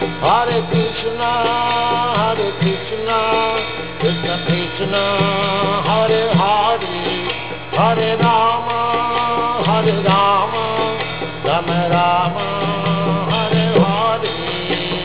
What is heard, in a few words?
mantra